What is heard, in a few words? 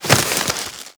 Generic Roll Ice, Generic Foley; Warframe; Characters